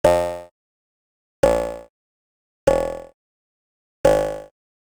Digital Click Negative

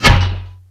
Mortar Shot; Weapons

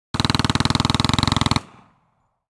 gun machine gun; NCH Library

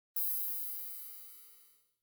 Button Sci-Fi Beep; Hollywood Edge; sound designer's toolkit v2

